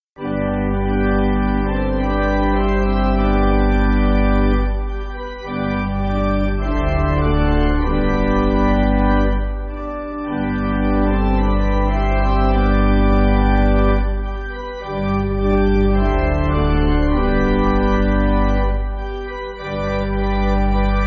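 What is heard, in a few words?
Pipe Organ, church backing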